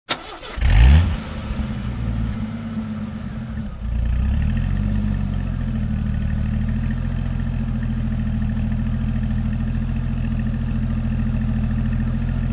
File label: Jeep Start Idle & Off; Cars